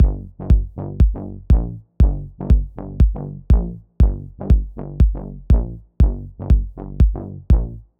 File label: drumloop